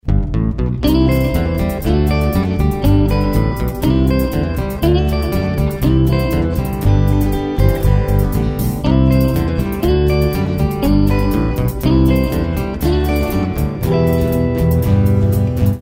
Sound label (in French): Film Muet Sonnerie